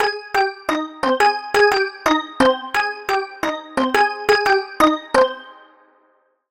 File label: Key; Routed Sound; Serum 2 Expansion - Story Walker Vol.1